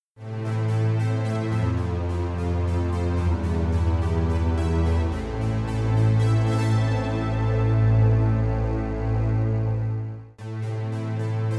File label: Backing Track, in A minor; I used 108bpm with the metronome in Logic